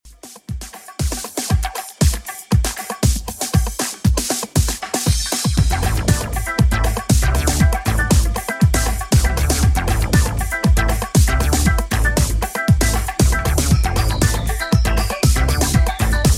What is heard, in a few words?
80s Redrum 118 bpm CLEAN